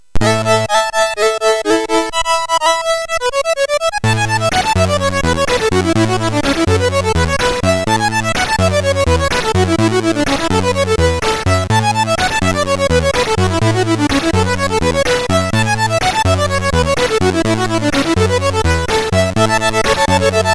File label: Final Synth Sample, MOS6581R3; hvsc